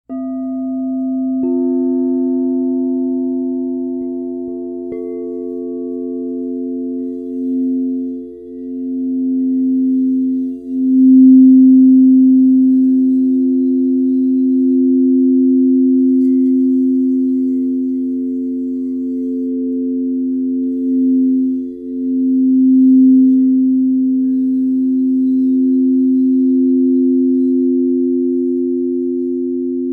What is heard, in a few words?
golden glimmer, singing bowl sets